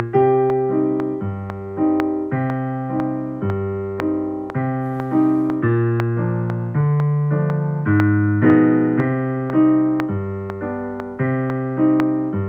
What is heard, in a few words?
Accomp